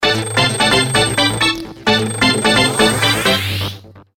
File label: two times ringtone